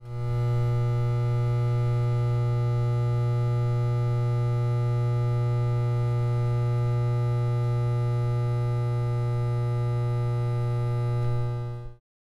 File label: Transformer Hum Industrial